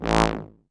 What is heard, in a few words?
Synth Shots; Vengeance Samples